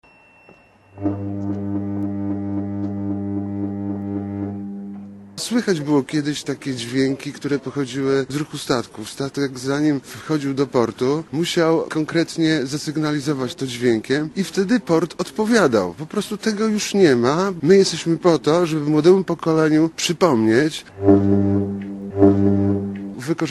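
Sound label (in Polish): hejnał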